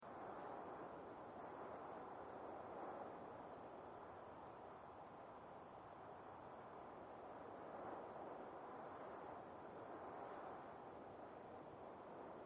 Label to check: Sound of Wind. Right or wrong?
Right